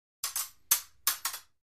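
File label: Fencing Several Swords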